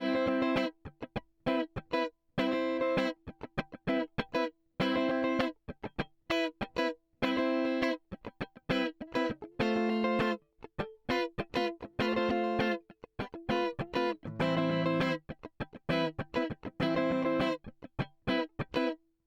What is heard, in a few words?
Guitar Rythm Comp th30 rat10